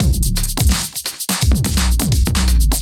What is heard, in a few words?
Duo Swing Mix, 85bpm; off the grid